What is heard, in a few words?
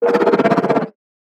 hammerhead idle